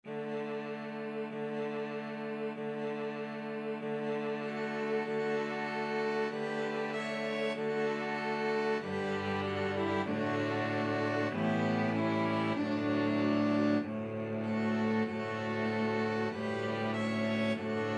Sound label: Weihnachtsglocken Christmas Bells, string quartet